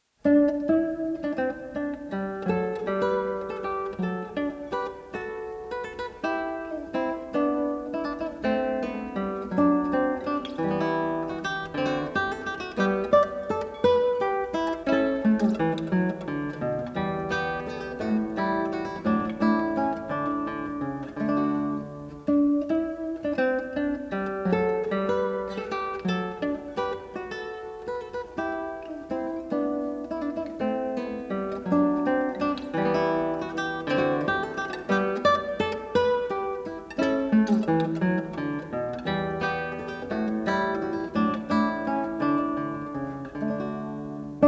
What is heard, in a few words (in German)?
suite für gitarre solo nr. 1 g dur gigue